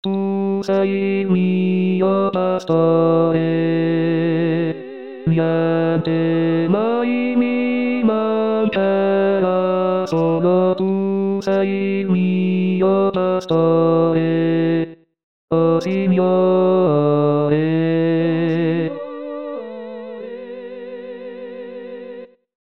Bassi; anno liturgico

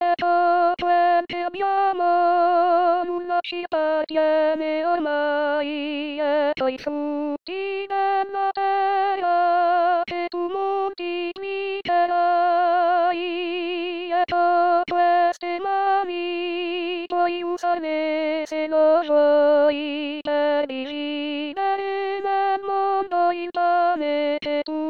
Ecco Quel Che Abbiamo Voce 1

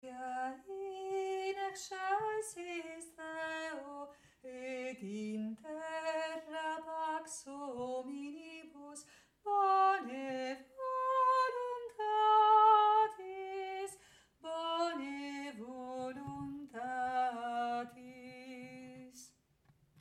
gloria rit soprani; anno liturgico

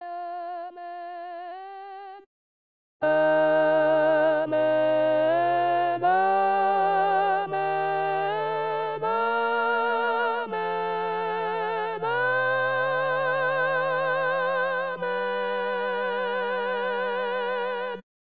Amen Solenne Contralti

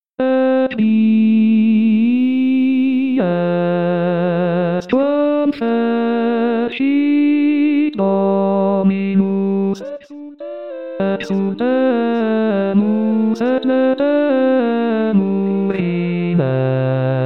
Bassi; Haec Dies; Pasqua

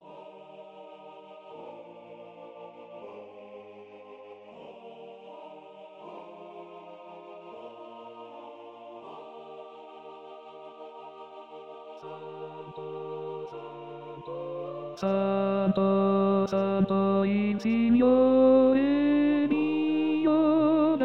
Santo Tenori; messe

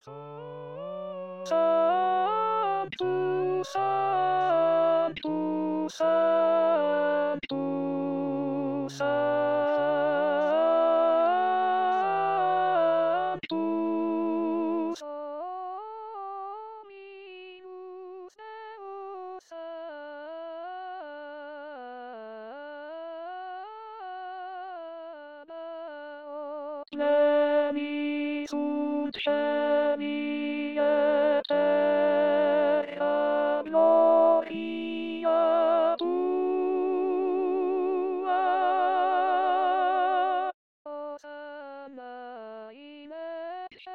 Sanctus Contralti

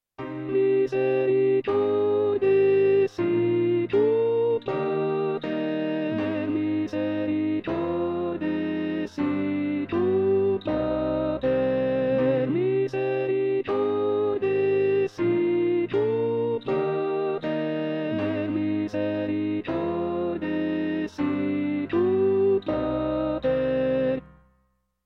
soprani; misericordes inizio

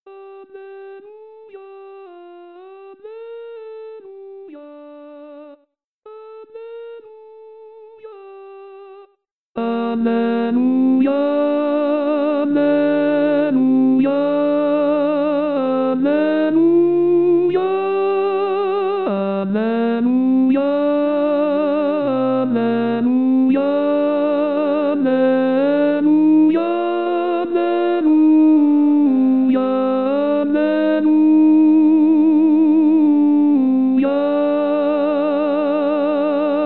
Alleluia Tenori; anno liturgico

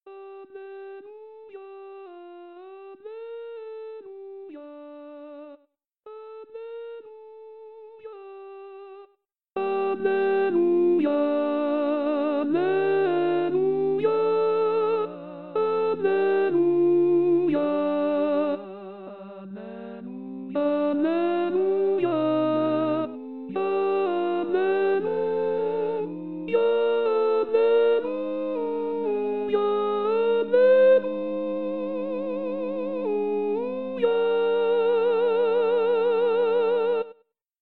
Alleluia Contralti; anno liturgico

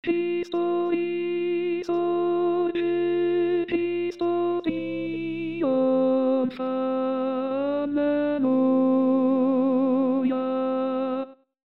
Contralti; pasqua

